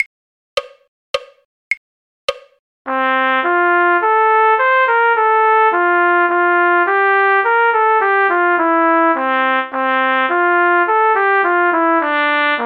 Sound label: the ash grove trumpet; folk